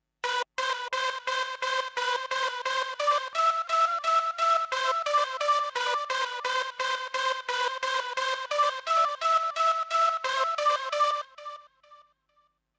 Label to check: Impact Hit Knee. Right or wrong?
Wrong